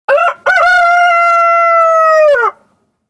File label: chicken cockering